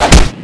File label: knife hit, Fists; cstrike; JAIL